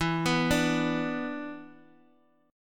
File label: E 5th 7th; E57 arpeggiated; JGuitar